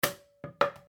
leere Plastikflasche

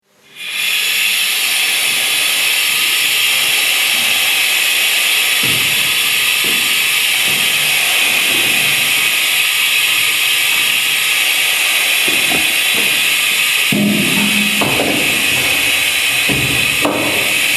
Feuerwehuebung Atmenluft

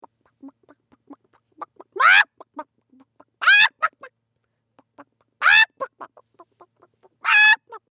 Mensch gaggern

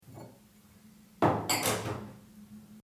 Glaeser

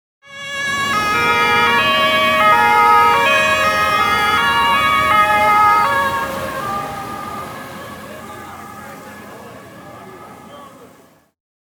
Polizei und RTW

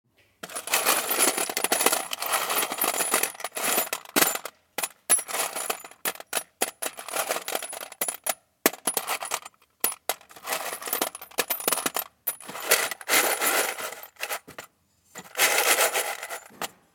Schrauben kleine Kiste

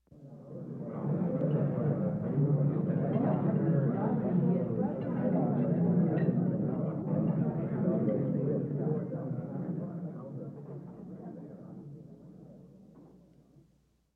Bei Tisch Essengong Triangel

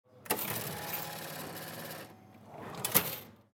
Kasse

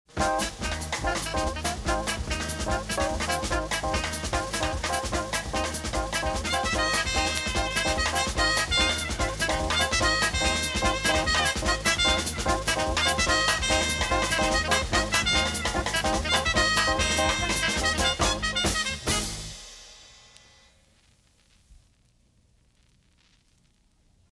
Tanzrythmen Samba

